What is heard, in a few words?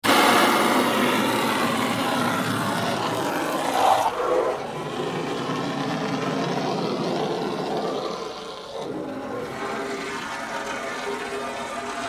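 Water Spray Interior Car Around Hard; Car Wash; Spraying; Big Room Sound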